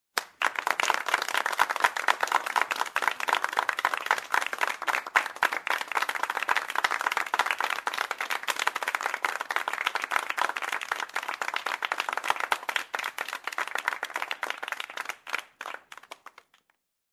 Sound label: Minimal Applause